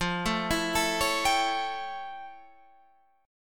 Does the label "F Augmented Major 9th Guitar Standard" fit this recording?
Yes